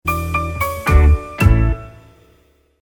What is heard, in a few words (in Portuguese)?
SINAL SONORO